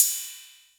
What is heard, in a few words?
Cy, Crashes & Cymbals